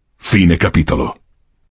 gui; Inquisitor 2

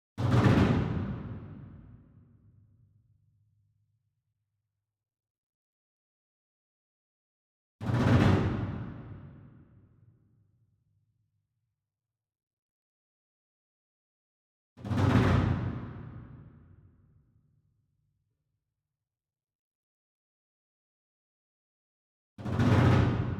Bass Drum Concert 42 Inch Roll Hard Beater Far; SINGLE DRUM; boom library; Cinematic Strikes Construction Kit

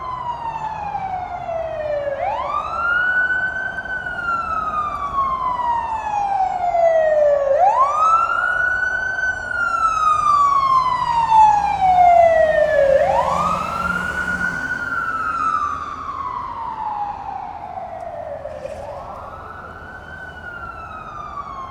Urban night vehicle siren; urban and suburban; boom library

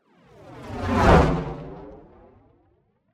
Space Ship By - Fast Pulsating Engine; Hollywood Edge; sound designer's toolkit v2

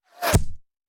Bullet Impact; Sound Ideas; Guns

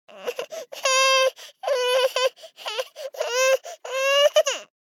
BABIES GROUCH Boy 12 months Impatient; Baby Boom

